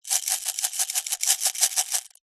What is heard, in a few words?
foley gemstones jewels in glass jar shake